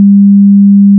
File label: sine